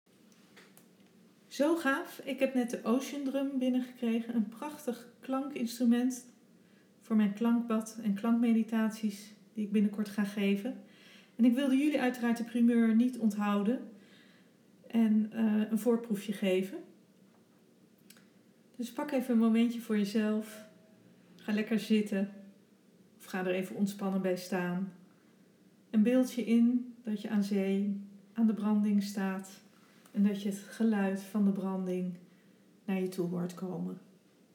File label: Ocean Drum